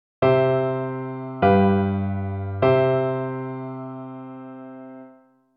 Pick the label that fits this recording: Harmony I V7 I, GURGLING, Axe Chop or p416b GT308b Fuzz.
Harmony I V7 I